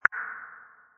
power Click

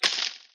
till

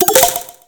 gift Send

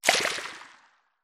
power Squish

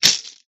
till

